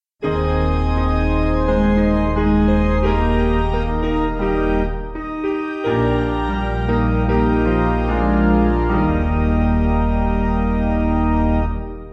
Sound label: Piano